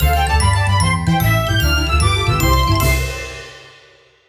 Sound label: Stage Clear Dance; Nintendo - Kirby's Epic Yarn; Video Game OSTs